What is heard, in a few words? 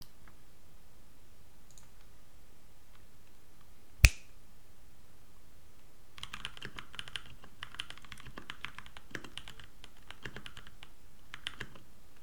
keyboard; build your own keyboard